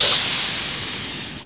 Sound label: trek door